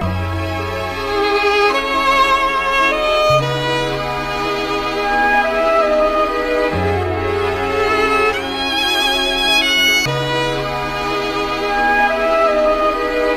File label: strings, Sad